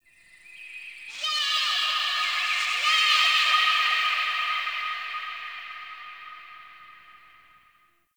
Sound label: Zariman Park Memory Echoes; Warframe; The New War; Ambience